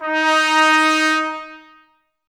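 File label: BRS trumpets D#; 90 s Sample CDs; Miroslav Vitous; Woodwind Brass Ensemble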